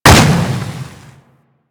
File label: Pulse Exp Impact Default; Warframe